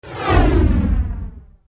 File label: whoosh deep